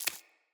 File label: Root Spider Step Dirt, Foley; Animals